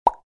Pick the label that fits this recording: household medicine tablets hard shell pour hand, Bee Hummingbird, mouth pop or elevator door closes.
mouth pop